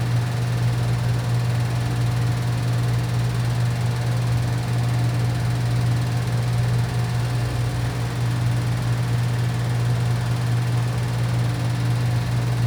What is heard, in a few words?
Vending Machine Hum Loop; Warframe; Ambience; Nineteen Ninety Nine